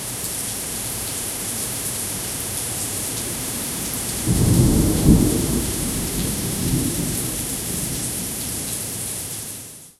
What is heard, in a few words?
soft rain distant thunde